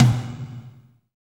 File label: TOM TOM, Roland